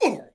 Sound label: Cbmn Hit Vox; Civ4 warlords